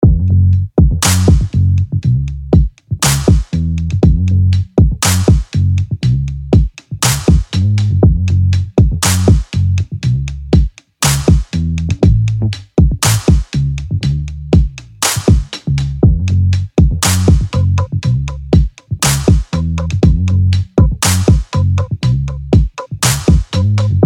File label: Awe 120bpm